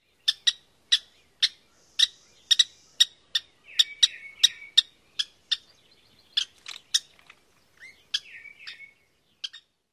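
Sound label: western kingbird kit calls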